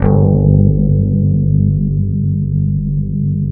BASS, Drum & Bass 160 BPM

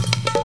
FIL TABLA; AMG - Now CD-ROM (Roland)

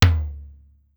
Tight Tom Hi, Pitched Down; Clavia DDrum-AT

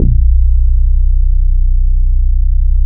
SATURDAY BASS, DISCO, Bass Analog & Synth; USB Soundscan vol.12; AKAI

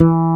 W-STATION, SYN BS; AKAI S6000 CD-ROM - Volume 1; SAMPLE WAVE MIXING 1

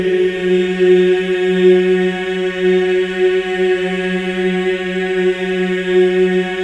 EES F#4, MEN EES OCT, Symphony of Voices; Spectrasonics

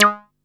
SHORTY B G#2; Best Service Alpha Dance vol.2; SHORTY SEQ

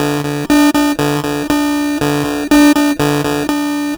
Guitar Loop, 120BPM; musicradar; bitcrushed; Crushed Loops

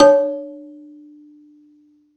Rubbery Piano, prepared piano; Total Piano

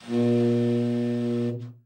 TENOR SAX; Best Service; Killer Horns

